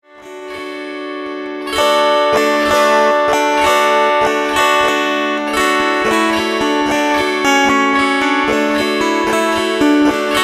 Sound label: kantele